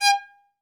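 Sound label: solo violin, strings; ILIO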